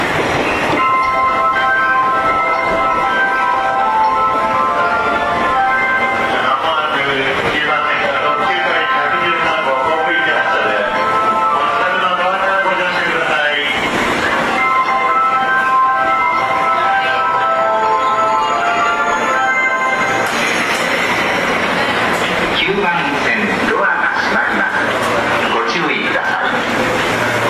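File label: Departure Melody, かいじ号発車!; 中央線; 立川・高尾方面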